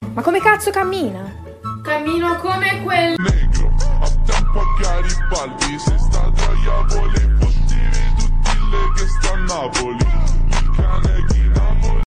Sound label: reverb